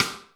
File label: Rimshot, Snares